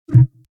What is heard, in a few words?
hover button